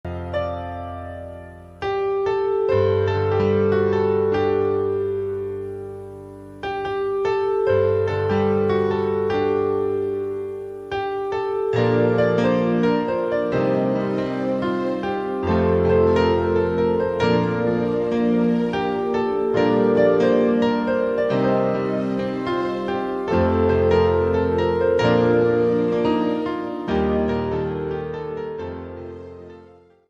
instrumental

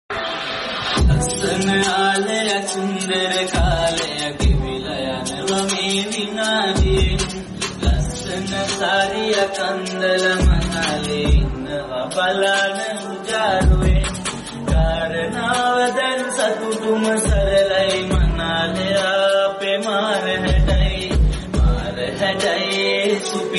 romantic odia

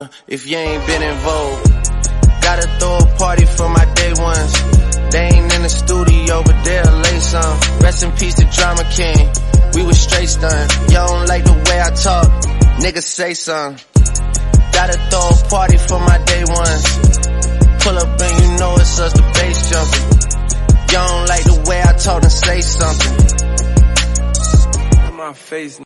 english song

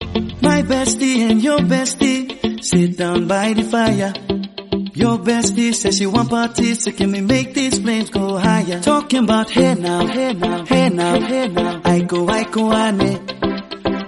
instrumental song

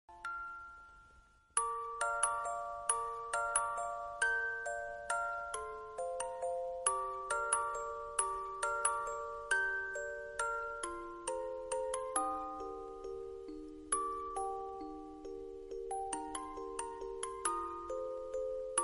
iphone

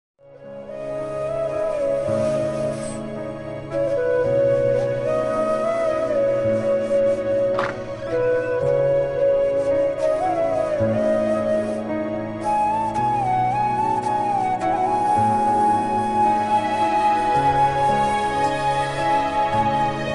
drama ost bgm; hum tum

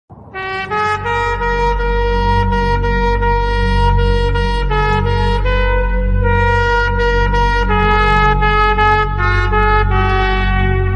jana gana mana instrumental national anthem india

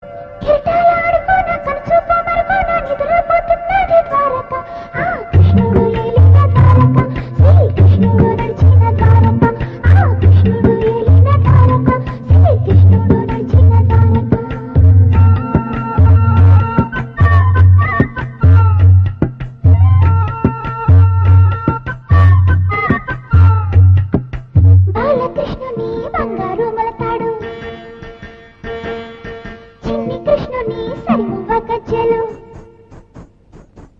telugu; devi putrudu; soundarya

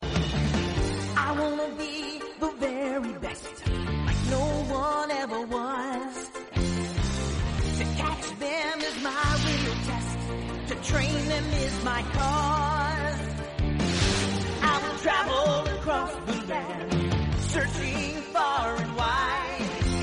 theme song

